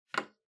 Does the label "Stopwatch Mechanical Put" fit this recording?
Yes